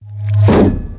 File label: Shield; Kilta